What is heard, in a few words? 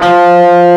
guitar F2; AKAI S-Series CD-ROM Sound Library VOL-8